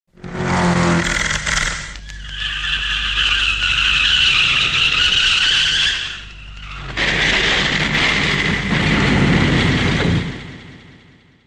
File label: Skid and crash; Al Capone